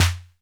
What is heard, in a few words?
SD 808 Pitched